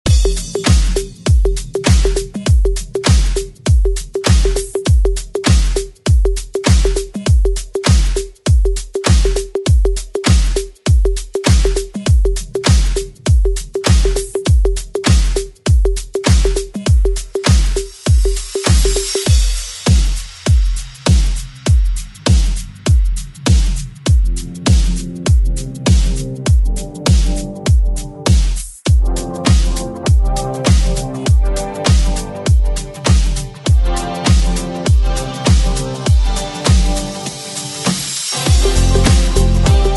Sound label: 80s Club Re Work; DJ Mhark